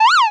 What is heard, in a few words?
fell short; BingoGrassland